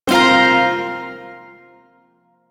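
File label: Shawzin Pentatonic Minor Chord; Warframe; Duviri Shawzin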